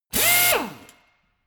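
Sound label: impactor long high, drill; maschinen; bohrer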